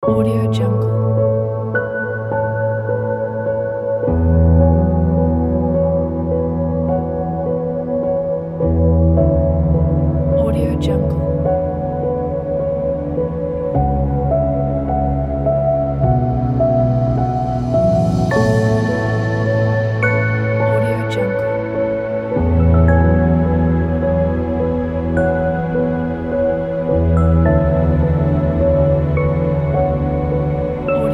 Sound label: dreamy piano soundscape